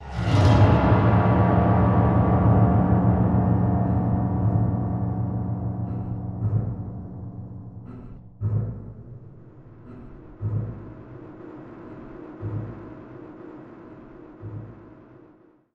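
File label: stinger swell long; transformers war for cybertron gamerip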